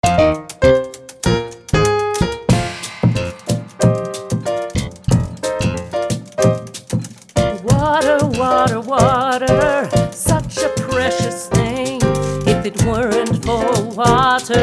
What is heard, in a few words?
Water music